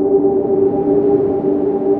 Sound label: Pentatonic Wind; Press Keys; Tree Tone Presets; Ableton; Factory Packs; Inspired by Nature by Dillon Bastan